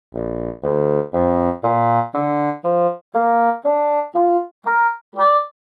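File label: fagot, bassoon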